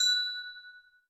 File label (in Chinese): 音符盒冰钟声